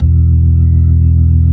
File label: B-3 Jazz; Keyboards of The 60's and 70's